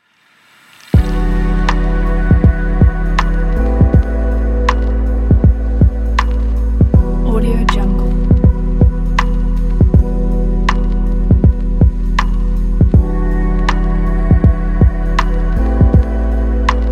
dreamy lounge electronic pack; minteapot-whale

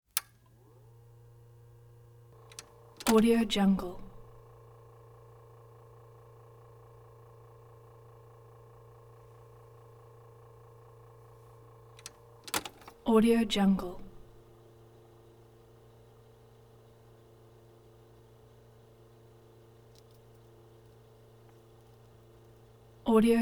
wire recorder start run turn off rewind

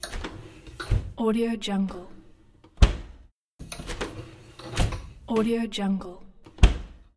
oven door open and closed; blazznet

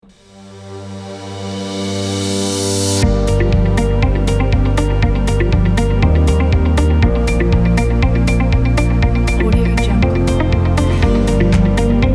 commercial logo corporate

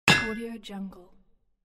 hammering metal

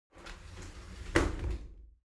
object bathroom shower slide doors close soft

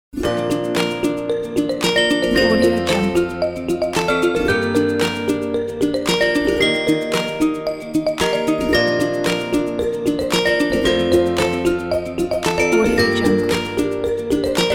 kids upbeat pop

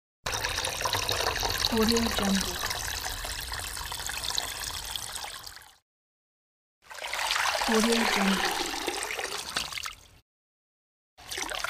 pouring water sounds

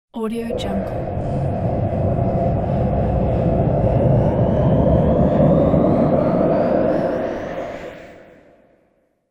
cosmic hissy deploy

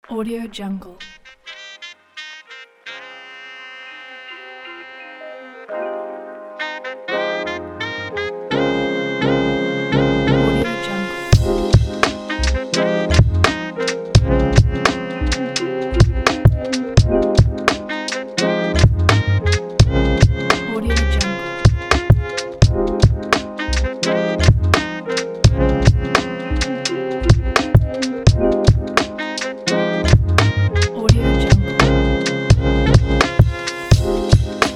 funk jazz groove hip hop

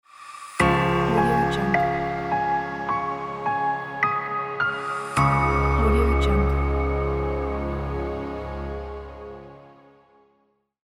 a logo

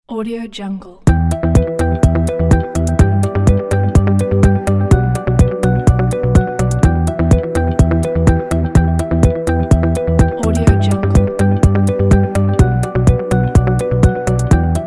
corporate presentation

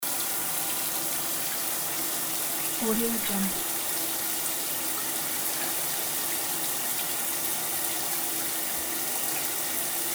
shower running loop

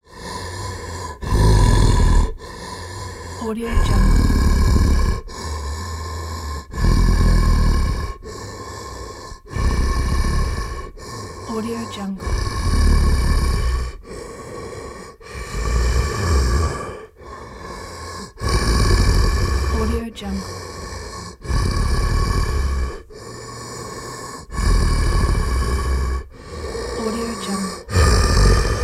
creature breathing; applehillstudios

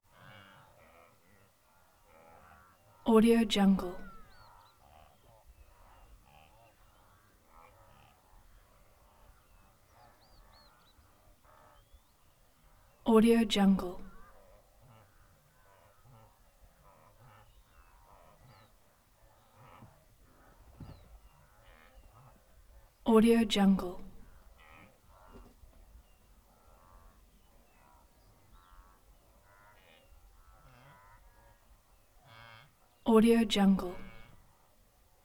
wildebeest maasai mara kenya early morning

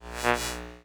lost transmissions tonal passbys; soundmorph

